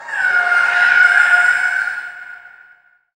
FX Dance

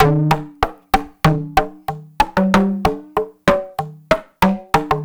Perc 95; musicradar

